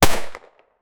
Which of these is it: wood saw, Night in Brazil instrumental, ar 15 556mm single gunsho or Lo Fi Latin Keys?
ar 15 556mm single gunsho